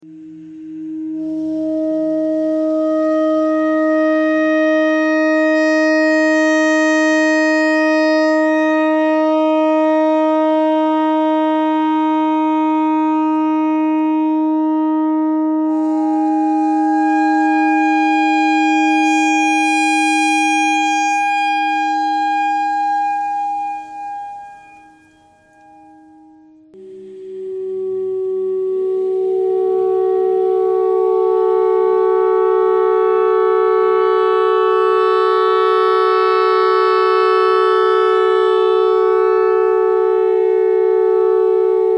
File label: clarinet notes e thread noise